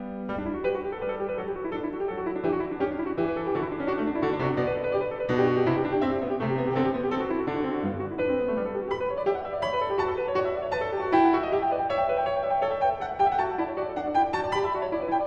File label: Bach Goldberg Variations BWV988 Variation 5 - Allegro Vivace